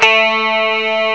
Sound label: guitar hi; GUITARS; M8; CMI Factory